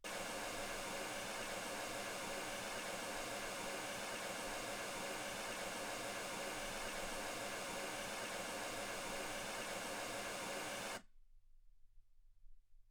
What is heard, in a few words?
mls16 48kHz